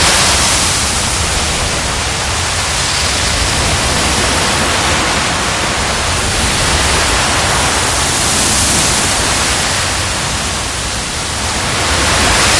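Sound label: Weapon Firehose Loop; the unfinished swan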